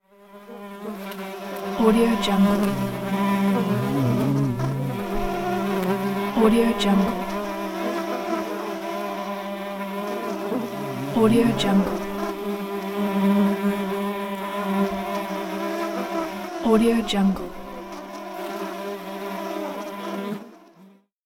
flies and bees

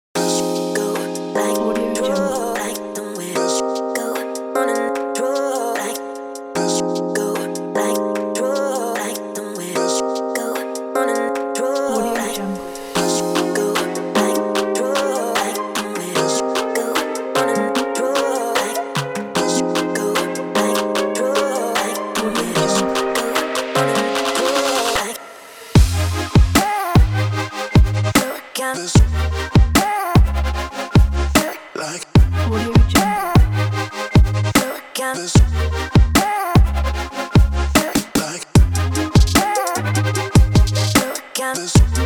future bass

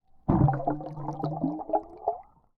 underwater movement; prosoundfx

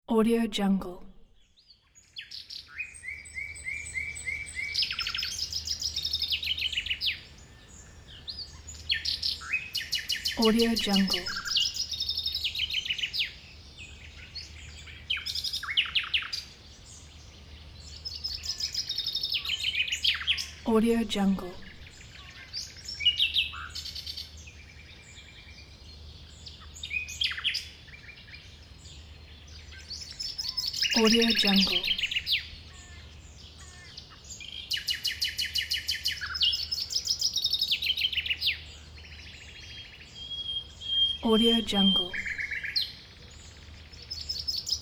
forest birds singing

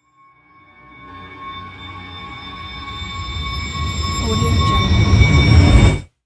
dread riser

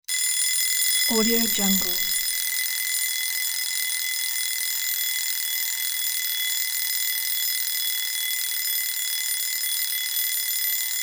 wind up alarm clock rings

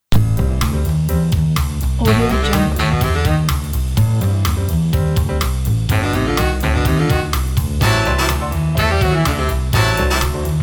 burglary; alexpaul-second

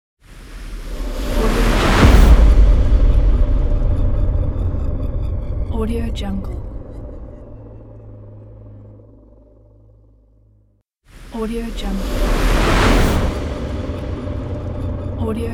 space hit with a woosh

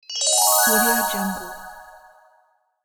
magic dust

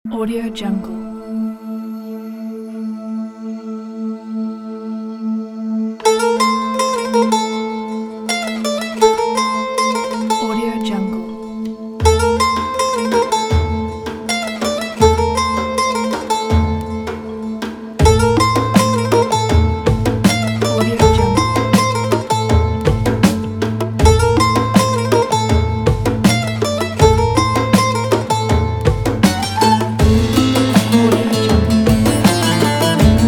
ethnic turkish energetic anatolian upbeat

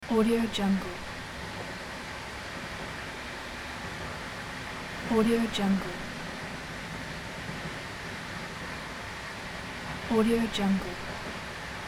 train interior ambience between wagons

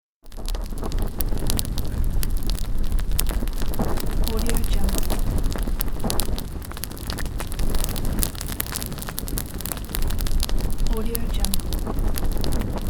fire

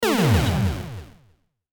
8bit fall

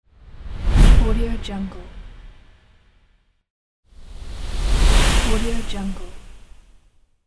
swoosh transition

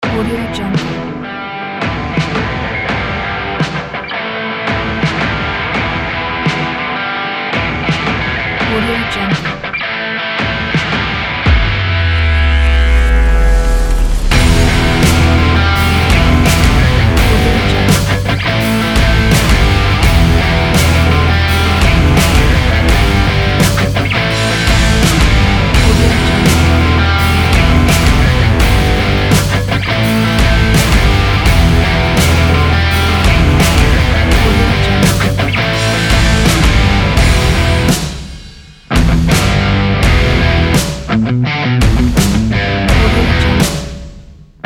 hard rock big beat

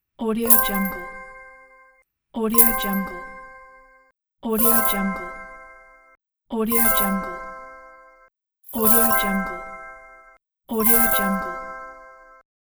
coins

